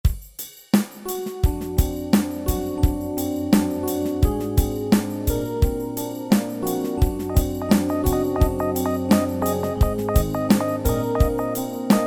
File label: morse waltz